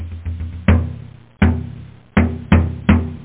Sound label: live fill, loop; da capo vol1; instruments